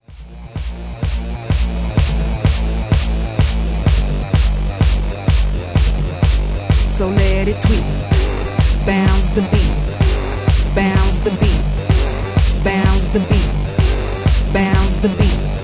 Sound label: vocal